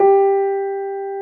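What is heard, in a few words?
piano G1, soft piano; AKAI S-Series CD-ROM Sound Library VOL-2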